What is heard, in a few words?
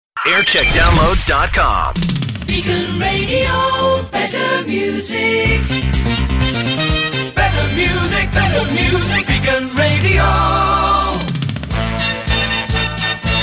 jingle montage; Beacon Radio; 1985